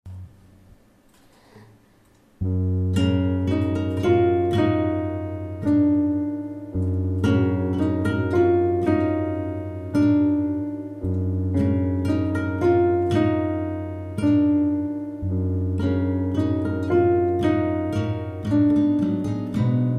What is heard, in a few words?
Piano Solo with Strings